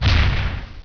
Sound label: flak hit, weapon